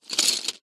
office pencils artists in metal tin roll hand over movement; zapsplat